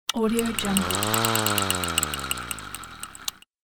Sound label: old lifting rattling mechanism; fxprosound